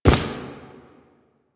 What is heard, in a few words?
Step Default